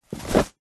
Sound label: clothing jeans denim pants trousers drop carpet; zapsplat